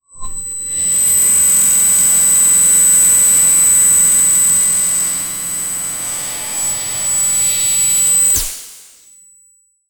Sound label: movement